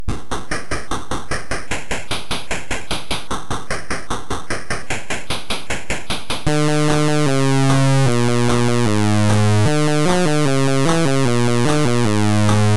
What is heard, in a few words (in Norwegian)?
musikk; c64; BASIC, machine code